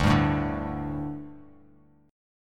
D Suspended 4th D; strummed

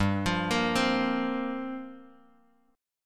G Minor 11th, Bass Standard; Gm11 arpeggiated; JGuitar

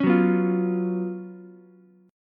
F sharp Major 7th Suspended 4th, Ukulele; F#M7sus4 strummed

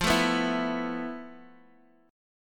F Major 7th Flat 5th, chord; down; chord shape

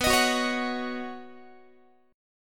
B Major Add 9th B Guitar; Badd9; strummed; JGuitar

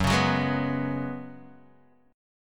Gb Suspended 2nd Suspended 4th, Guitar Open D

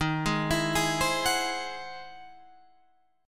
E Augmented Major 9th

